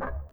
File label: perc, 100bpm